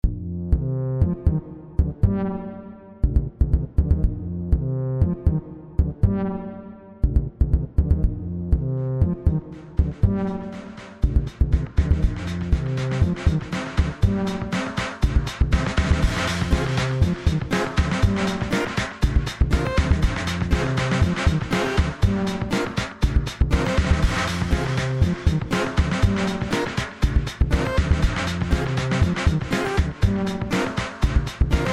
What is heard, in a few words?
Funky Dubber; Modelonia